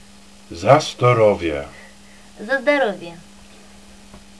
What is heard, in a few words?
cheers both; За здоровье; Russian